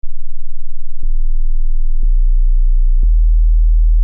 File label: purr